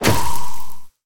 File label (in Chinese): 攻击冲击声; 监守者